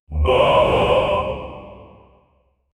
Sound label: deep gothic mens choir